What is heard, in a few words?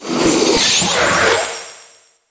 octopus hit; DAoC